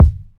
short kick sample D# key, Royality free kick drum sound tuned to the D# note